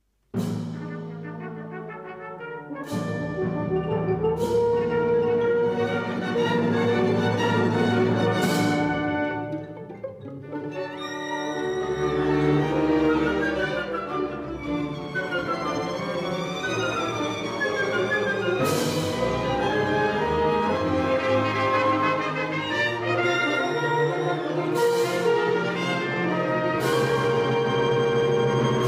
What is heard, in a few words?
Fanfare